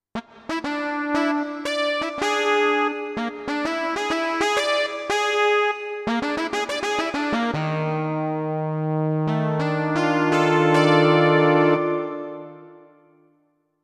Pulse Saw

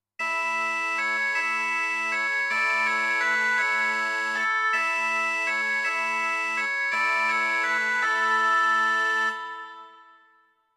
Bagpipe

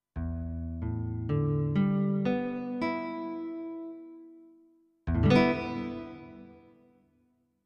Nylon Guitar

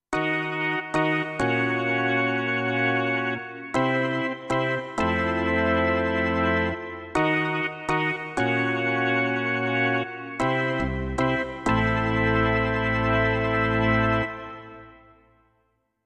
Fast Rotary

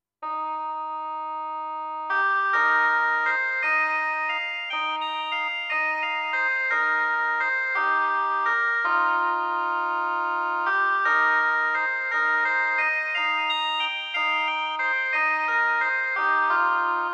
Shanai

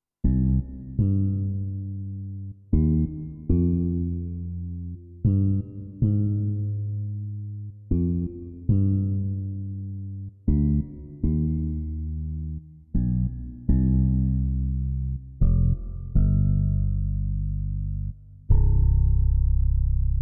Punch Tumb

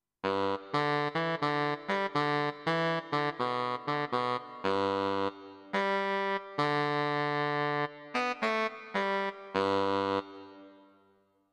Baritone Sax

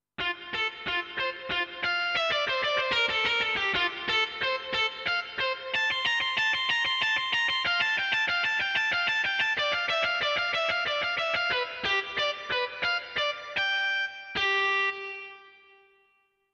Feedback Gtr

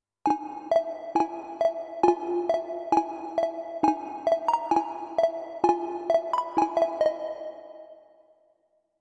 Popcorn

